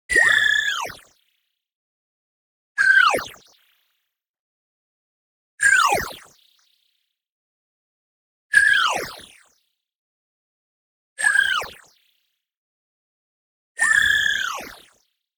MOVEMENT FAST Warped Frequency Shift Movement; boom library; Anime Essentials; DSGNMisc

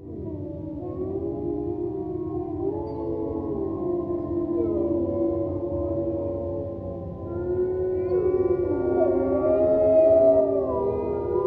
wolf pack; animals; best service Blue Box

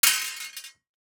DICE on Metal, Throw and Roll, Mini, Three Dice; Samples; ArticulatedSounds; DICE Sfx Party (Extended)

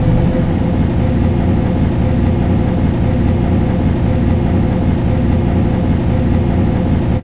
bus inside; best service Blue Box

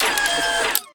Gun Draw Select Scifi, Weapons Foley; SFX Kit

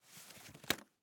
Face Mask, Medical; Blastwave

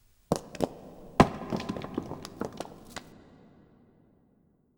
Rocks; Inquisitor 3

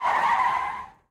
skid short; Blind Drive

